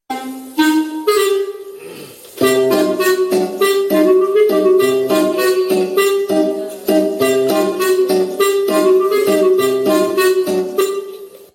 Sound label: Vodafone Tone